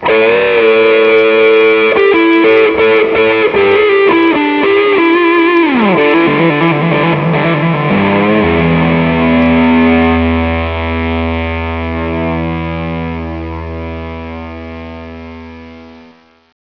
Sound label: guitar; svencoop